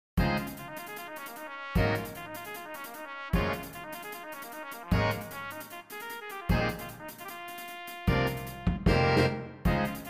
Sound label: Fast Samba